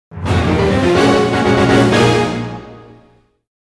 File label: Mission Start Jingle; fire emblem musou fuuka setsugetsu original soundtrack 2023